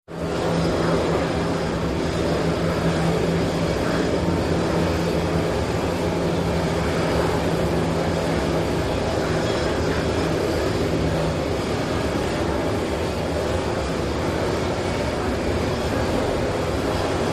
Docking area, general jet noise at new york jfk airport